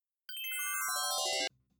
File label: Transition, pinball; Surf n Safari; Gottlieb